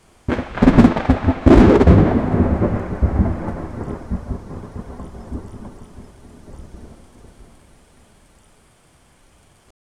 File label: loud thunderclap with a deep rumble